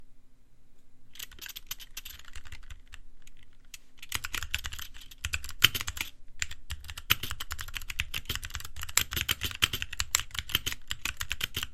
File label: yoga keyboard; diy